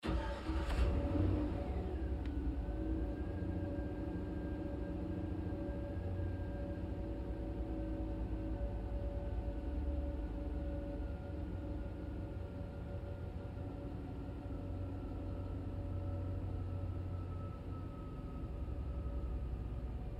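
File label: Truck GMC Savana Interior Start Idle Off; 2010 GMC Savana; Trucks (Sound Effects:Vehicles); Big Room Sound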